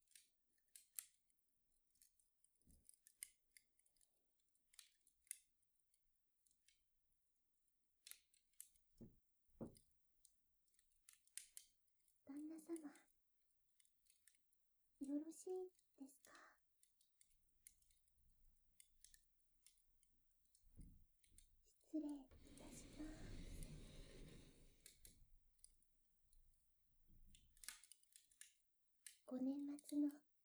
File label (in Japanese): いろり, 囲炉裏の音; 囲炉裏SP; 道草屋 すずな; アイヌ系